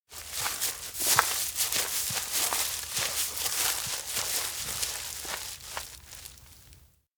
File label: steps outdoor wheatfield depart; spica; anemoi